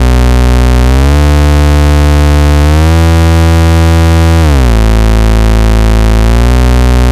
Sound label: C64 Slide Bass, Commodore 64, Chiptune; 8-Bit